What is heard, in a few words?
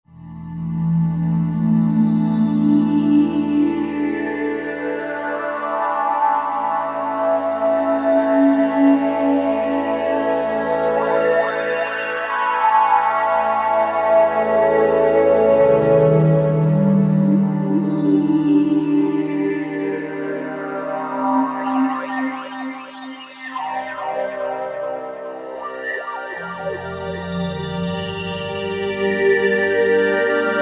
Roland JV-2080; Dimensional